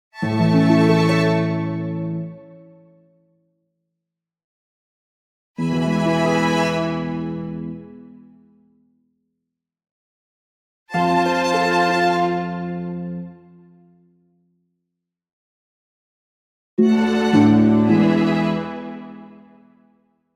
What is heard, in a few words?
Orchestral Jingle Neutral; boom library; The Interface